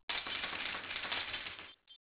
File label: glass